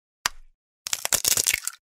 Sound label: break the egg; شکستن تخم مرغ